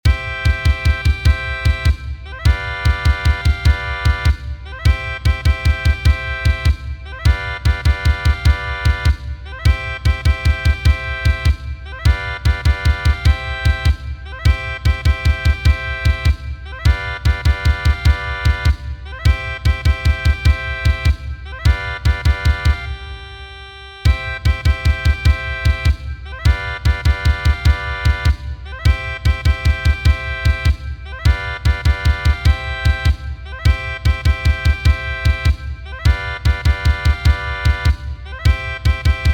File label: Irish Washerwoman Solo C